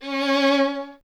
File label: Vln Detache, Solo Instruments; Miroslav Vitous